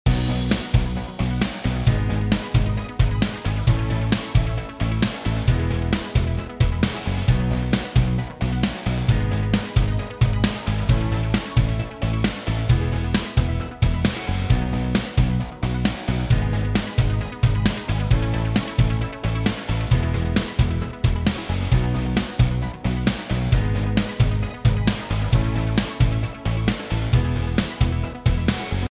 Reveal Jazzy; Littlest Pet Shop Your World

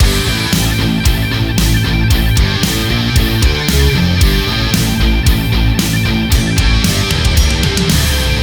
Points Counter Scene; Iron Meat; PS4 PS5 Switch Windows Xbox One Xbox Series XS gamerip 2024

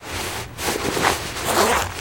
Prisoner Clothes On; Prison Architect

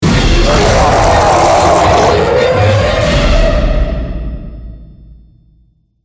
Stng Lrg Zombie

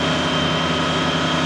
Car loop; blastmorph pinball; xbox series xs

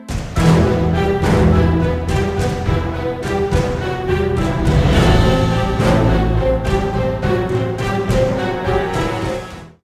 jingle move bridge; Haunted

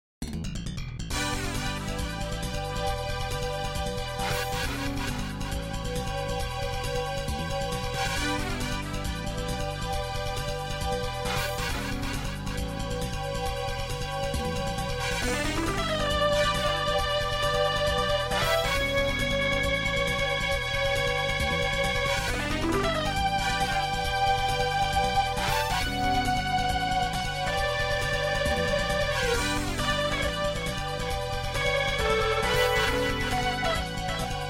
MIDI Scene

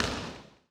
Ball Dribble Regular Court

